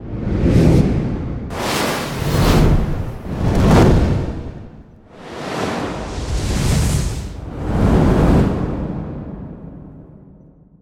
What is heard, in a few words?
fire whooshes, Cinematic Whooshes